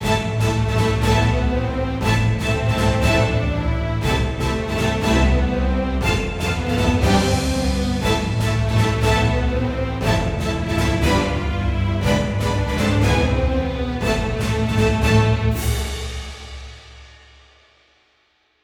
heroic forte

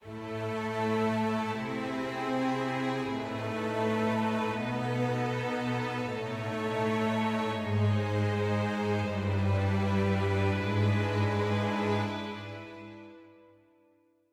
dark forte start

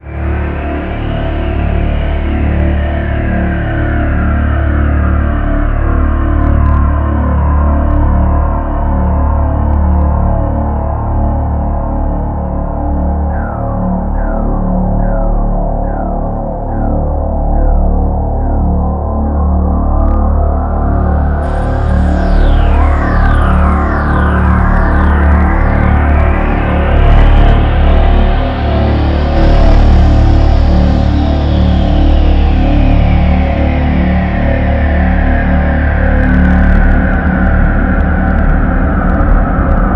Haunted Ambient; Gore: Ultimate Soldier

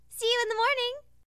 SFX Bedroom; hilzartov fairy size doesn't matter

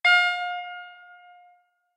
End Ok; Puddle; puddle plus ios gamerip 2018

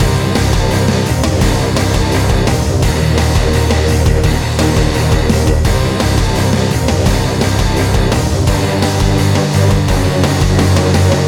Combat; S.T.A.L.K.E.R. Clear Sky

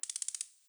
numbers rotate medium

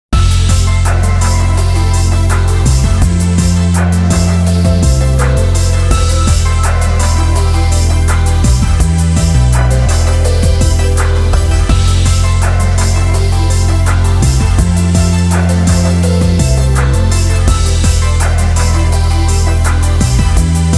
Your Melody (instrumental); Strawberry Feels Sound Track Sweet Sour

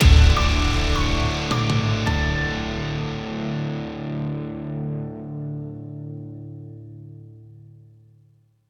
Boss Track Jingle; The Binding of Isaac Repentance

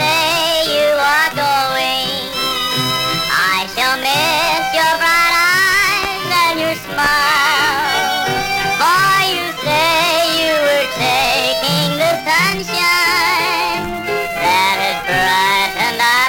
Old music

